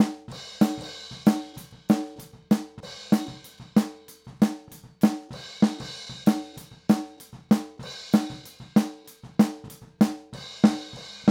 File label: Snare No FX